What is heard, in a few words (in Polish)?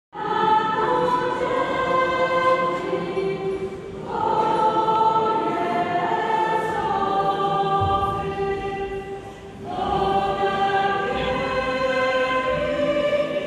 Chor